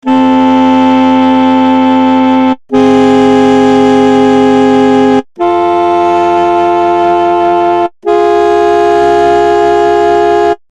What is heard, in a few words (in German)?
Altosaxe overdrive warm; Altsaxophone verzerrt; Dann mit der gleichen Verzerrung wie das erste Beispiel